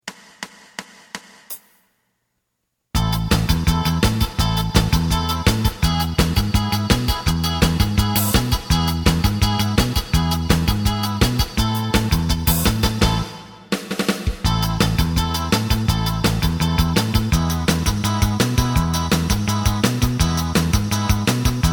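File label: lead guitar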